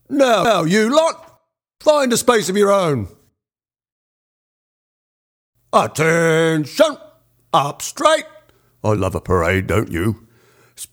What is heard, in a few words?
The Liberty Bell march with voice over; listening room